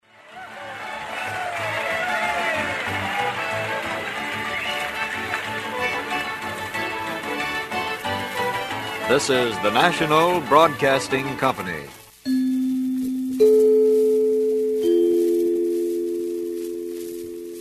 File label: chime; our nbc; museum